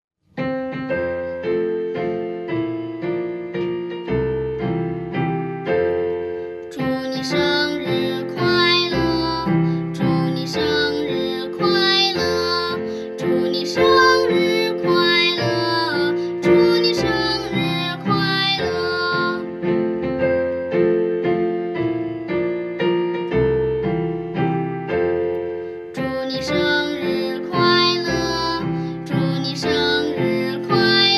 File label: Song Happy Birthday